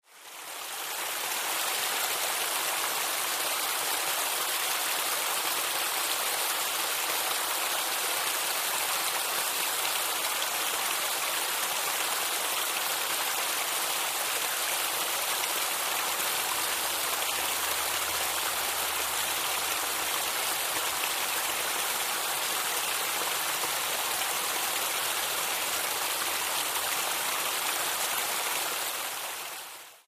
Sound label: Waterfall